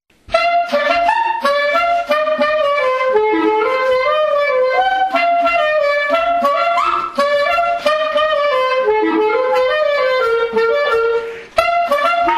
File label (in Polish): polka lisznianka